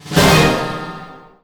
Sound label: kill, music; Counter-Strike Global Offensive